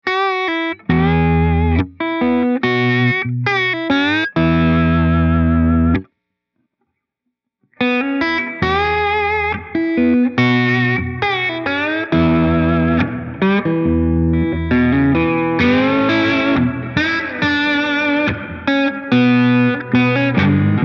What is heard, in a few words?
Room LA Sound Slide